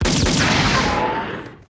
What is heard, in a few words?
SHOT SEQ; Total Control - Action Cutz